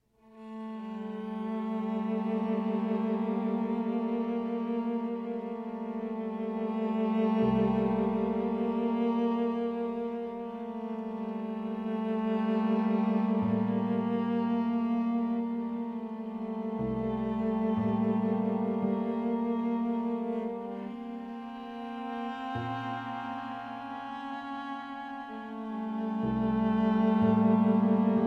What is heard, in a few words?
quartet for viola cello and digital processor